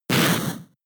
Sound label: Hail turn damage